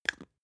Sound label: spice jar smooth close creak fast; industrie wirtschaft; gastronomie